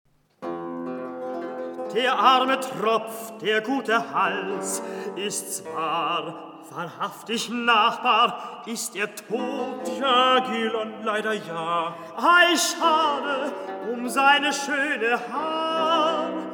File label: Recitativo; Scene 8.; Der Arme Tropf